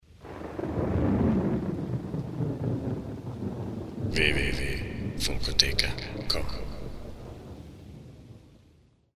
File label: Radio jingle